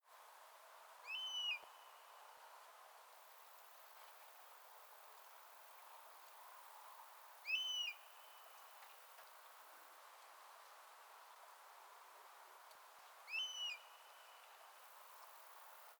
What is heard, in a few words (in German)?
Wespenbussard Pernis apivorus